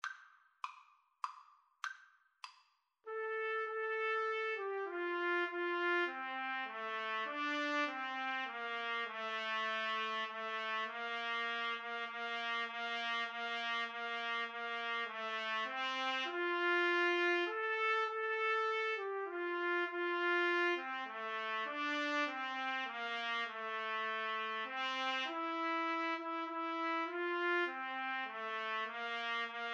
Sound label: Trumpet Duet